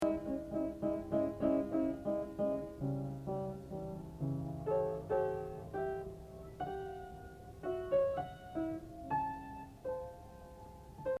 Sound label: Piano